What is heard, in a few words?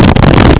SHUFFLE